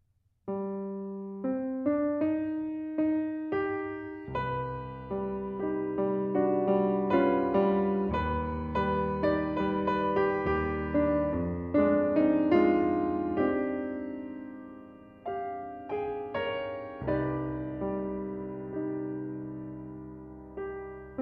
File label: Blues